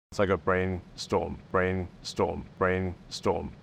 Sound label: DROP